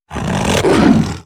Goblin Atk

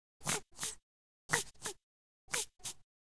Hamster Idle

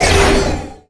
Leech Magic

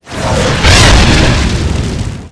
Dragon Skill; Seer; GamigoNA

